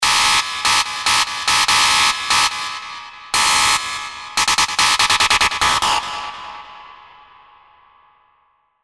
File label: Screech Dark Noiz, Sylenth1 hard techno; revealed sylenth1 hard techno vol 1